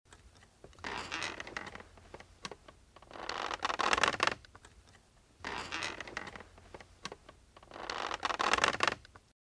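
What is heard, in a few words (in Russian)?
rope swing on a tree; Звук качелей раскачивающихся на ветру, металлических, на цепях или веревочных, закрепленны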